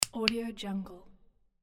flashlight switch

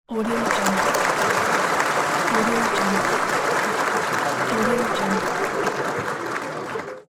clapping

